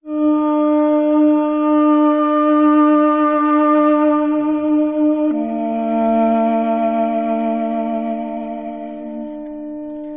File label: sax, Sad